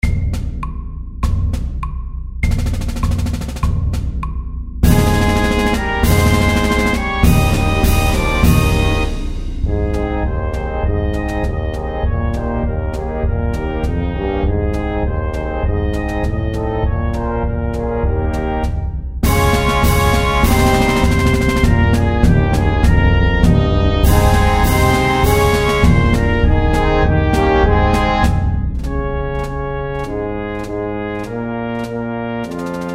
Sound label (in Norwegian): Marsj, 100